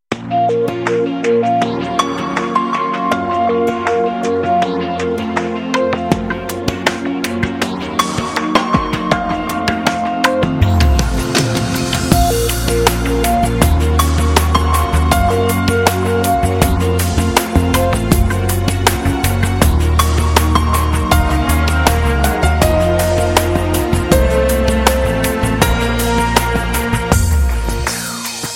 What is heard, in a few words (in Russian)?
mp3 melodiya